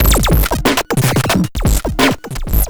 grind, EMU XL-7

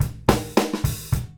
FILL, Grooves Filz Hitz

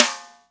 Snare, Ensoniq ASR-X

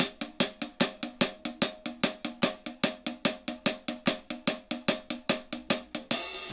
HAT, HI HAT LOOPS, Dub; Drumdrops In Dub VOL-1

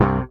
SHRT C, Bass; Sampleheads; Will Lee Bass Library

